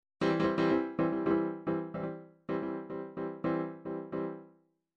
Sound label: chord lines mambo, midi